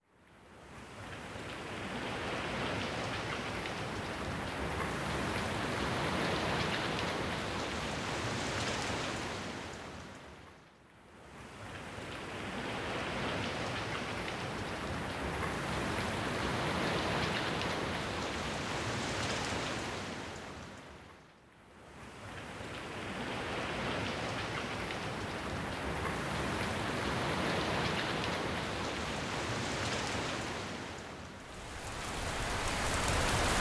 Regen; Muziekreis

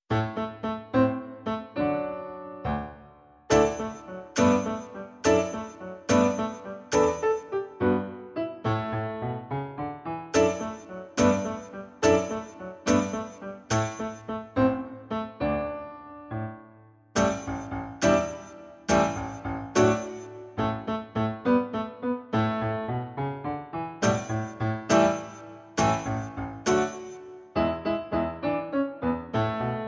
Het achtergronddeuntje van de Krokodil; Muziekreis

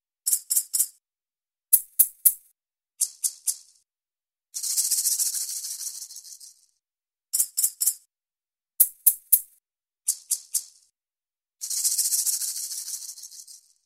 Het geluid van de maracas; Muziekreis

